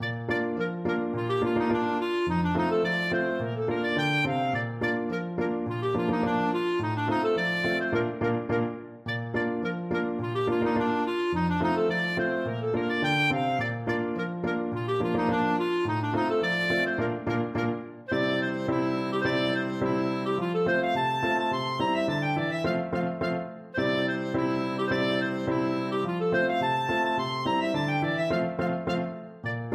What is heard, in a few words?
holiday schottisch, clarinet